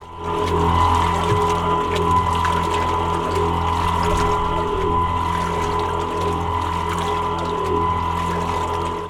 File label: mixing, factory sounds; svencoop; mustard